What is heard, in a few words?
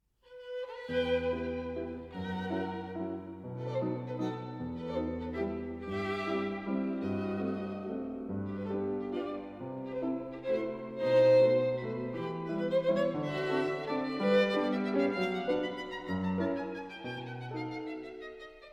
Waltz